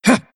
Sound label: attack short; Pangolin